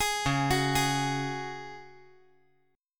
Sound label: C sharp Suspended 4th C sharp down, chord